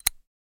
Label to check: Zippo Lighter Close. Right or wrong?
Right